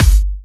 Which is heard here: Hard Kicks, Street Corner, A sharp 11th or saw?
Hard Kicks